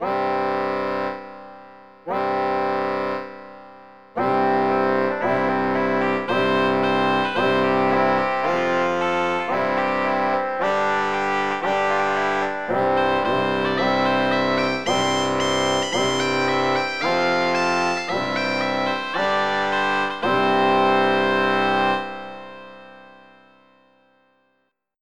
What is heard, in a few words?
bagpipes; REED